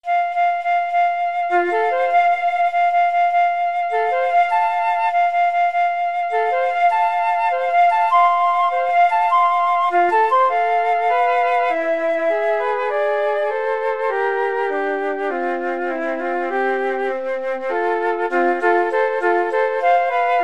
mendelssohn wedding march, flute duet

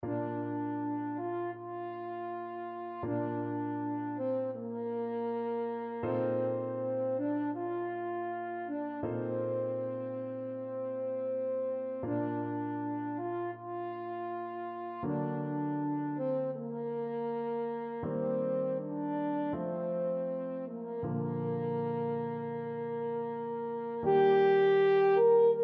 french horn

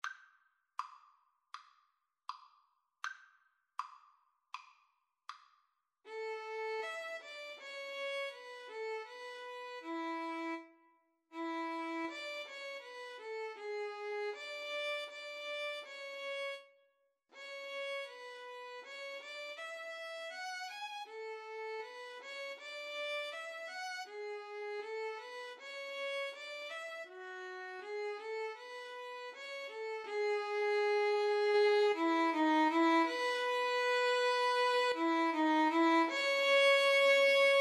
winter, Violin Cello Duet

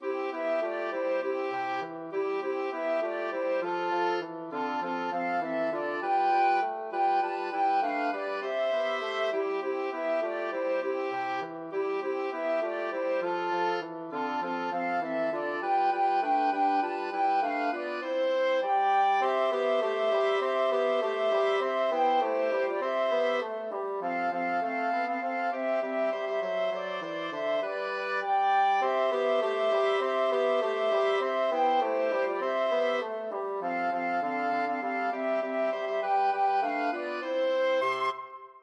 jingle bells, flexible mixed ensemble 4 players

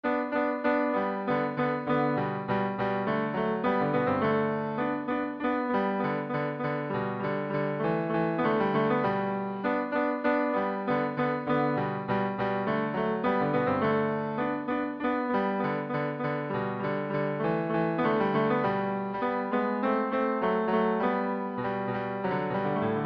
duos, bassoon duet

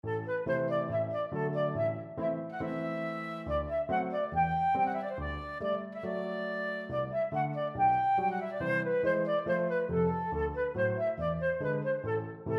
romanian folkdance, flute

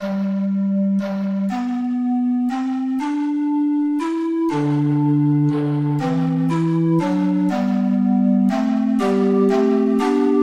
organ

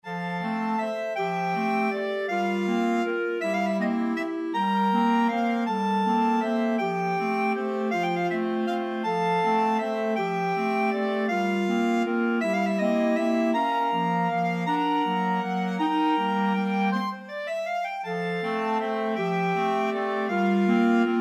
5 clarinet, clarinet choir

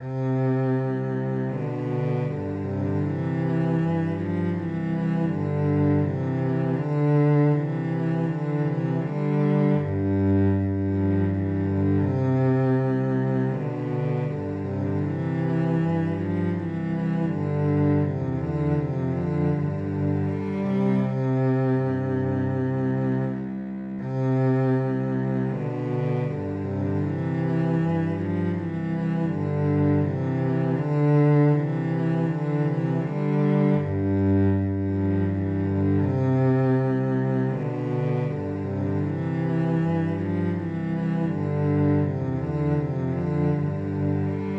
cello duet; trumpet voluntary duet

